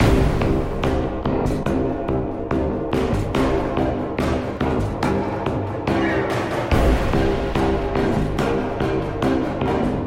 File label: V'Ger Combat Alt Mix